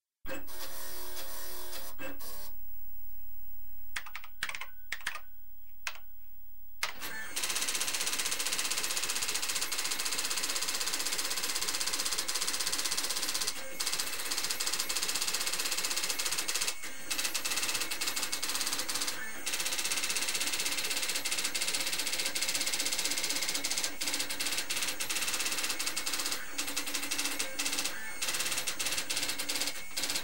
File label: computers printer